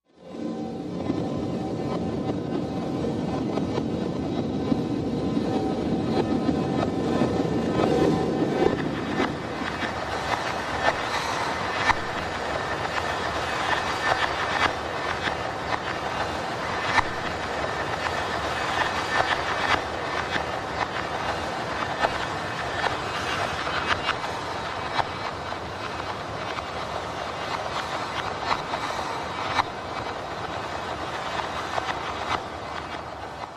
Rain Falling Up, Machine, Space Ambience, Airy Wash, Reverse Echoes